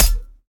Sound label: trident hit; smite; poseidon